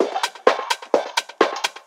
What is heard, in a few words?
FX Loop 128 BPM, House